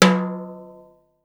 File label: timbale hi, Yamaha Motif ES drum machine; 300 Drum Machines; Yamaha Motif ES-Series